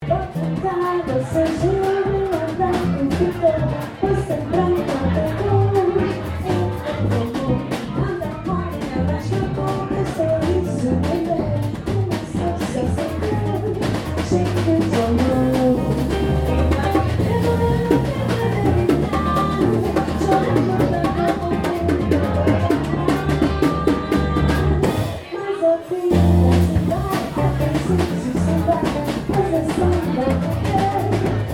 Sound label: batucada